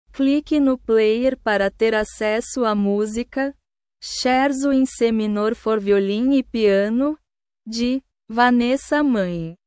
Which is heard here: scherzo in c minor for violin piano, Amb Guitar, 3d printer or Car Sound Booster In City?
scherzo in c minor for violin piano